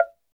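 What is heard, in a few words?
percussion wood, wood block; Roland LCDP11 Africa VOL-1; Wood & Metal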